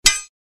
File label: Sword clash impact metal clang